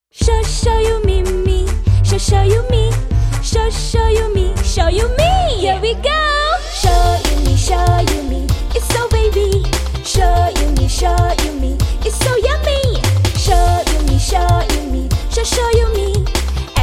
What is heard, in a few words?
Jingle